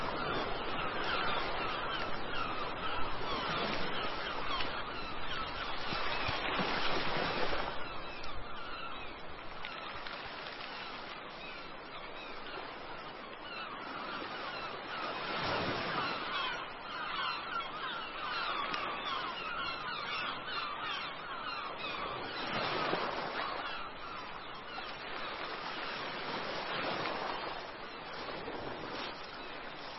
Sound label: Amb Seaside